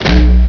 launch, weapons; XDM

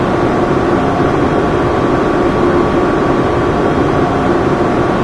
ambience; XDM

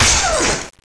electro, weapons; XDM

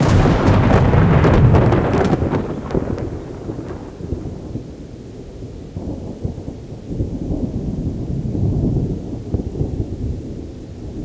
fire thunder; XDM; ambience

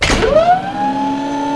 move, doors; XDM

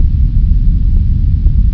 quake; XDM; ambience

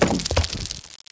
concrete, debris; XDM

